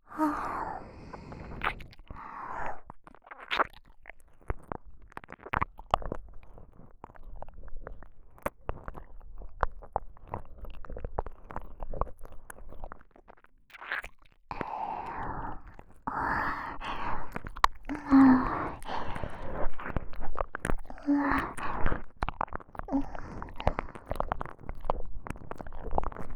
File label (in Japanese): 耳舐め(左)-ループ